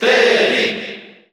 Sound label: Cheer Korean; Terry; SSBU